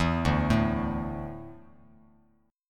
D Suspended 2nd D down Bass Standard, chord; arpeggiated

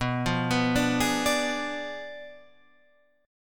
B Diminished 7th B down Guitar Standard; arpeggiated; JGuitar

